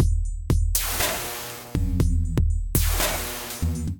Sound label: Kit, drums synthesized; Largeness Kit; Ableton; Factory Packs; Drum Essentials